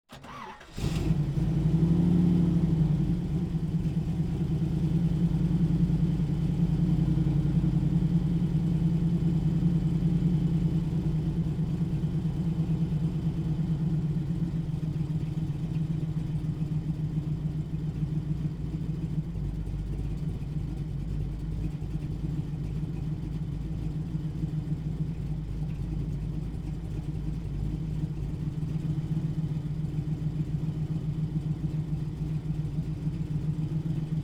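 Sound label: Pontiac, Firebird, Exterior, Start, Idle, Steady, Blips, Off; 1969; ORTF; MKH8040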